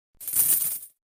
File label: coins; barya